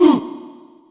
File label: Rx7 Uuh